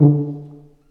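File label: brass tuba C, staccato; Solo Brass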